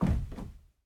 bodyfall Wood Rustic Distant Mono Soft Impact; Red Libraries

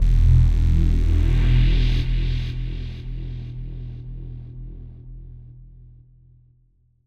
Transition Synth Filter Drone; Glitchedtones; Scene Switch- Transitions & Textures

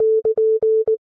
Left Parenthesis, Morse Code; InMotionAudio; Morse Code Samples

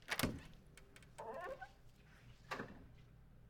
Door, heavy, classroom, open; UberDuo; The School Audio Playset

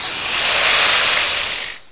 hiss, antlion; hl2

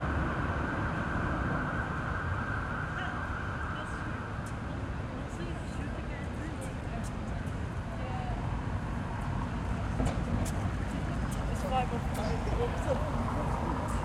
City Ambience, Manchester Ambience; InMotionAudio

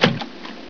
slosh, player; hl2

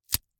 gore - bone break wet juicy - hard; Timothy McHugh; Gorification [HD]

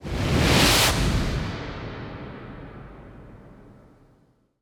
Searing, Cinematic Transitions; Orbital Emitter; Cinematic Transitions for Editors Volume 2

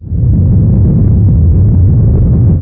rumble, antlion; hl2

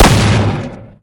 npc shotgun fire; hl2

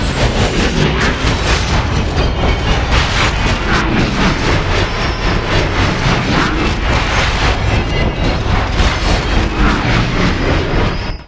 gunship crashing; hl2; combine gunship

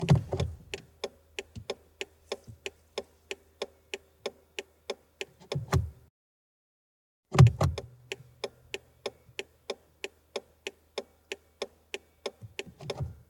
bmw 530d e60 foley turn signal mono; Soundholder

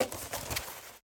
box handling; 3maze; Box Abuse II

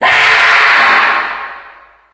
breathing, stalker; hl2

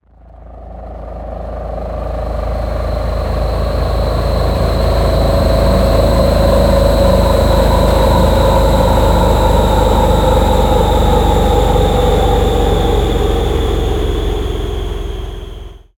spaceship passby, Cargo Spaceship; BlueZone

